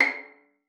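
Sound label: violin pizzicato C5; Xsample VOL-5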